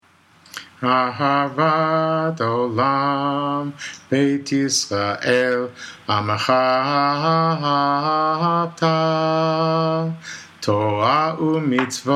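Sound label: Ahavat Olam acapella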